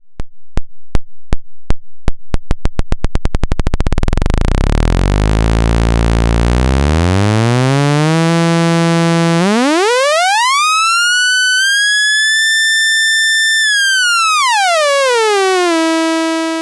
Quad Envelope als LFO Oszillator

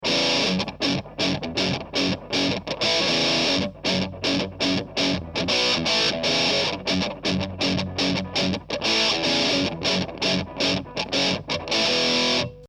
steg halbe Zerre

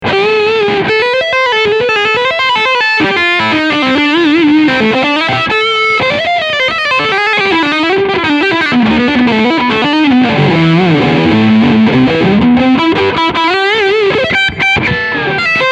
Leadsound Steg PU

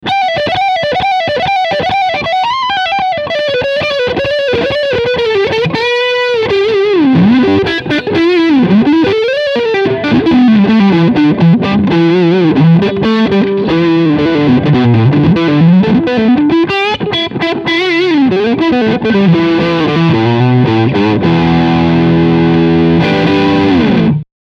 Riff Lick HB Front und Steg

